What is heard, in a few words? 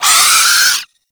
Arm Vocal Wind Up; Enemies; Warframe; ManInTheWall